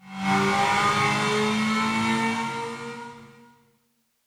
hotline freespin intro; netent

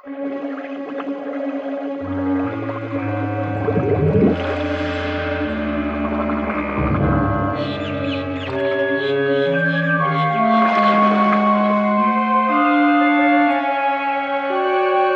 Freespin Intro; netent

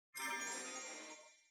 win Flash; netent

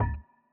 reel Bounce; netent

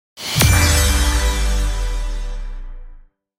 ringtone Sms

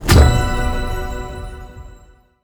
scatter Hit; netent

